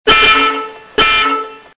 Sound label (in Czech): klakson